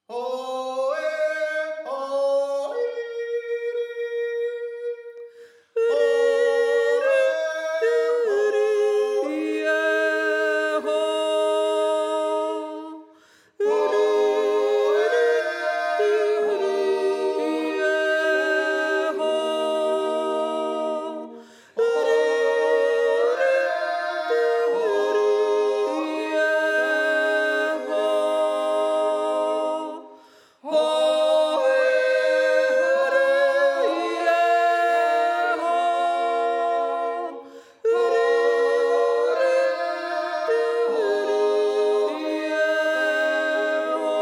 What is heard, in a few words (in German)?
Steirer Dreier Sopran